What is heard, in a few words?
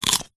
human eat bite carrot raw crunch single; zapsplat